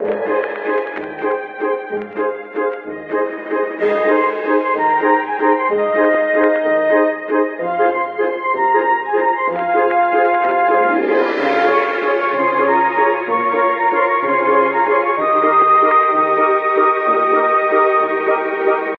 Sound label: Grammophone Waltz; Clouds & Sheep; android ios gamerip 2011